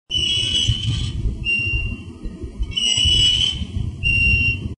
swing

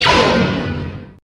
blaster; sabine's

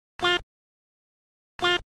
duck quack; pewdiepie

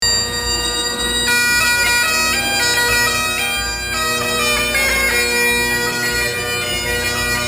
bagpipes cucaracha

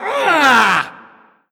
hurt; ultrakill minos prime

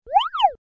toy noise

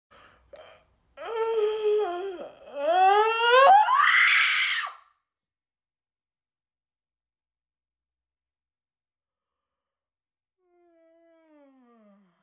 yawn scream

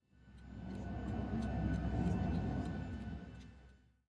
new minecart sound; quertyit

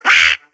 duck quack; donald duck

